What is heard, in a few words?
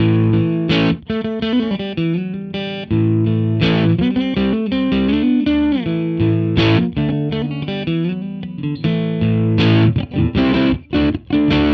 Fender Bassman; Audiovergleich Mooer Nux